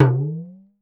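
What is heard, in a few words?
talking drum; Voice Crystal - Steve Reid Definitive Percussion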